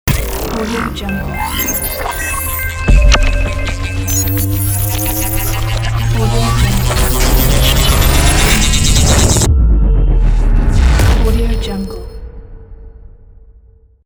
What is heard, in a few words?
glitch logo